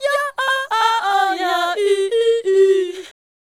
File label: Fem Vocal 128bpm G FX, African Voices